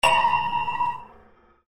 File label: metallic impact